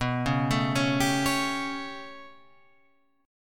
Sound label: B 7th Suspended 2nd Sharp 5th B down Guitar Open F; B7sus2#5 arpeggiated; chord shape; JGuitar